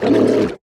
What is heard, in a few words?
Swamp boss Damage head; Folk Hero